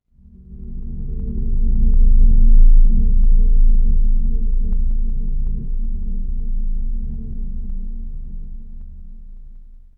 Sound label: horror drone